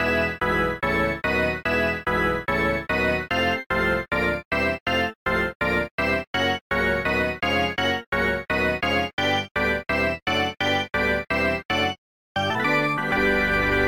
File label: Organ Chant